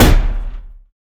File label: Door Hit Kill; MiSide